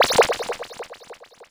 teleport

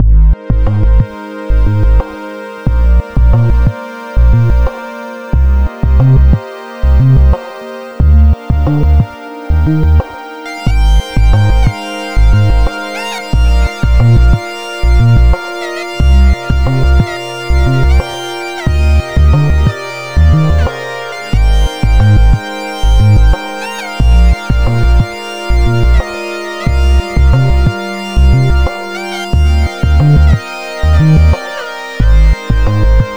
test dssi xsynth pad

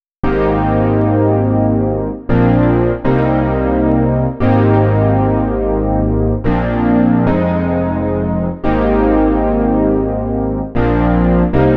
piano; obxa

